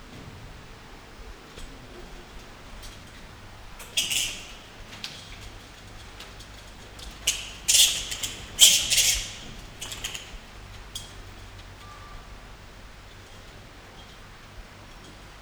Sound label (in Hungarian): indiai repulokutya puska; állathangok; nyíregyházi zoo 2010